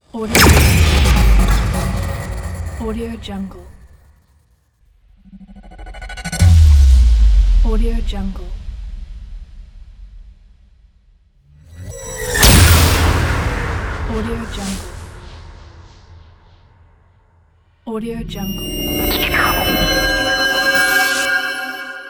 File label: punchy transitions